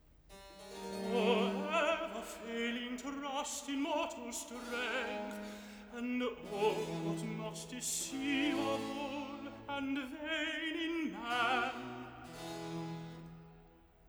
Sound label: Recitative; Handel; Samson, HWV 57; Act 1; Oh ever failing trust